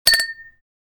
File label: Wine glass cheers and toasting